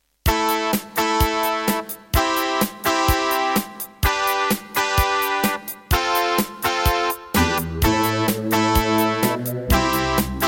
Roland SC-7

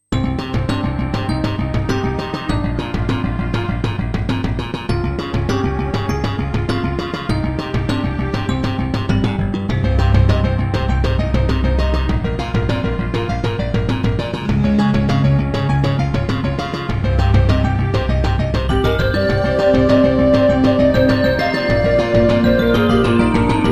Turtle Beach Rio; Introduction; Hocus Pocus

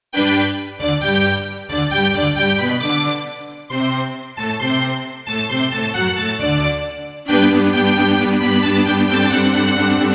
Yamaha QY70; Mozart - Eine Kleine Nachtmusik partial; classical

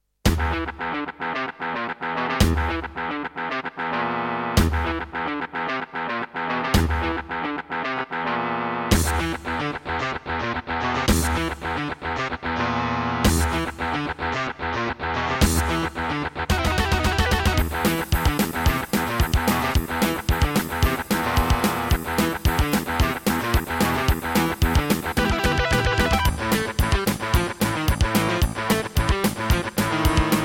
Roland PMA-5; Doom

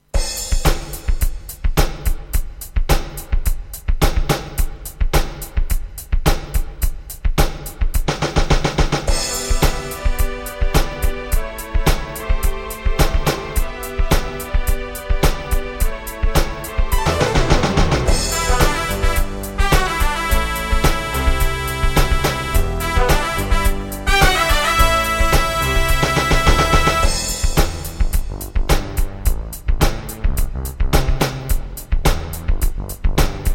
EMU Sound Engine

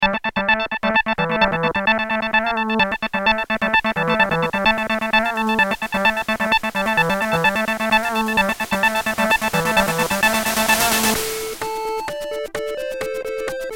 Creative SB 2.0; System Shock

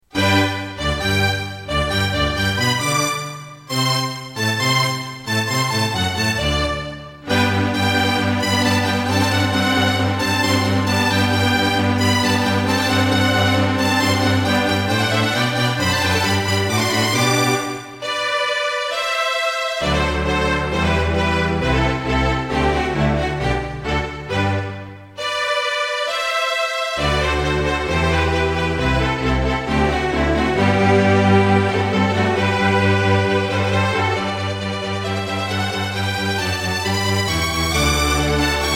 Roland SC-55; classical; Mozart; Eine Kleine Nachtmusik partial

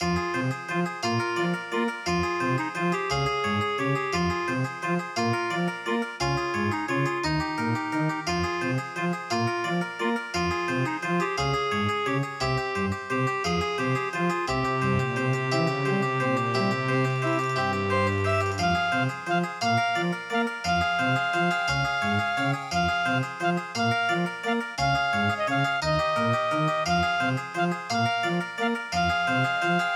Track 6, Casio CTK-2100; Death Gate

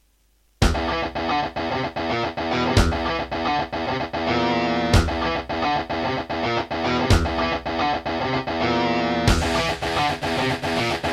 Serdaco MP32L GeneralUser GS Bank; Doom

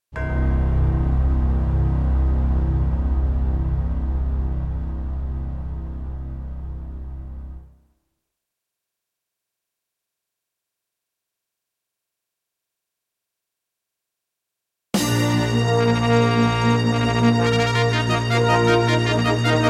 Intro, Yamaha QY70; Dark Forces

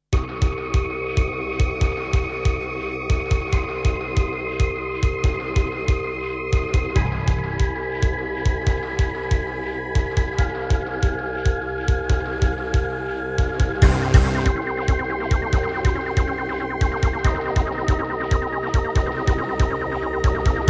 Roland SC-8820; sigil doom episode 5

